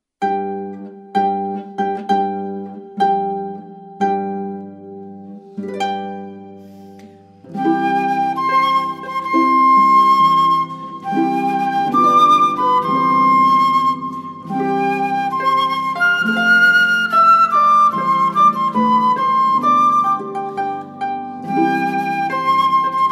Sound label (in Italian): arpa flauto; Marcia nuziale; Lohengrin; matrimoni